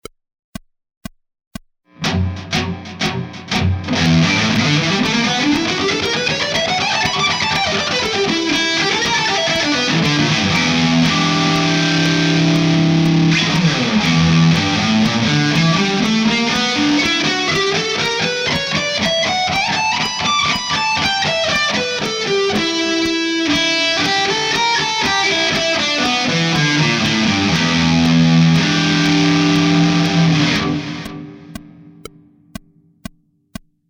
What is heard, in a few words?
connecting scales, guitar